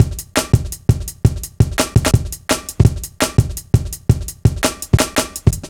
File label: Live Wire, Drum Loops, 168.5, Jungle; Zero-G Jungle Warfare 1, 2 & 3